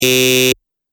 Electronic Buzzer Long

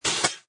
Metallic Whoosh To Hit; Scifi Production Elements, Set 2

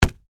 Sparring Glove, Impact, Hit, Punch; Sports; Boxing; BlastWave

